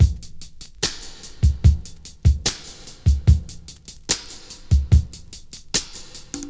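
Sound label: 74 bpm drum beat d key, kick tuned to the D note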